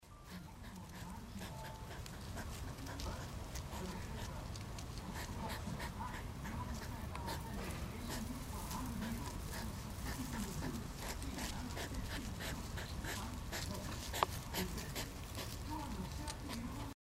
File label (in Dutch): Egel Erinaceus europaeus Geluid; Annelies van Klinken